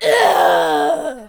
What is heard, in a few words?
Harvester Death Vocals